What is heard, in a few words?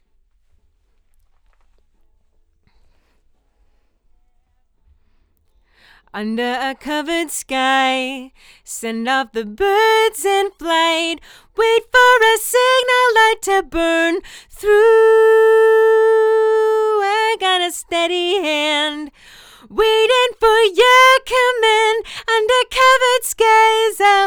Ld VOX Double